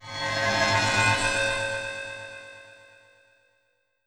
Horror Resonant; music

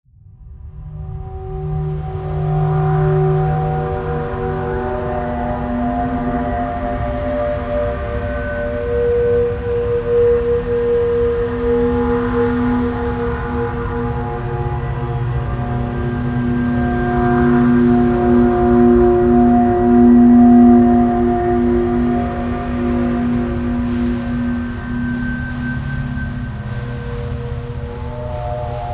Horror Ambience; music

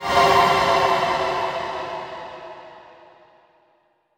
Orchestral Scary Impact; horror; music

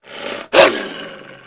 DOG SNARL

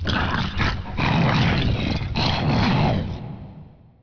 DOG SAVAGE

